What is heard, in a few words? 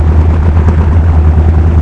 tractor on low